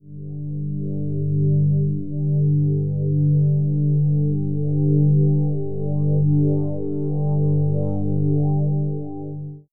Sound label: pads